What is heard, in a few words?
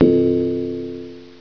clock